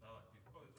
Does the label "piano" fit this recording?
Yes